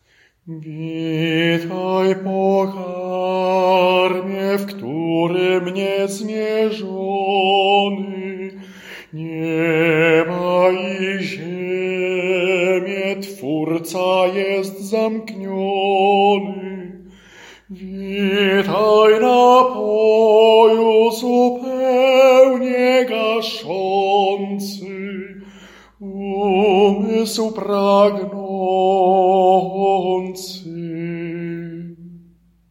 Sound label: SOPRAN; Witaj Pokarmie